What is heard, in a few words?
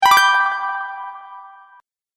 Magic Bright Bonus, video game